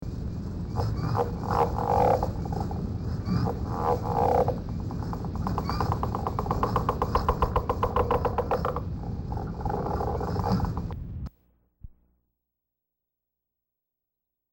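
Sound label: toads Northern Leopard Frog; wildlife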